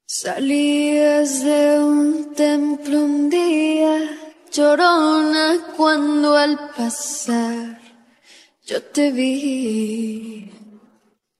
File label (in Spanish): Solo Voz